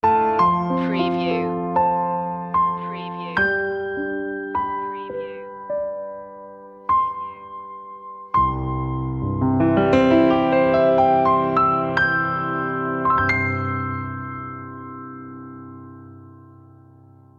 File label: piano; media music now